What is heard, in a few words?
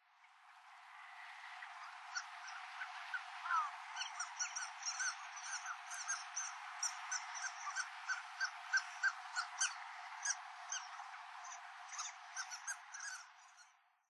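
Harlequin Duck, tomamae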